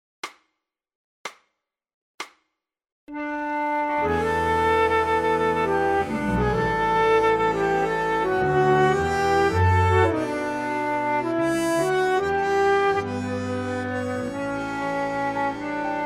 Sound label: flute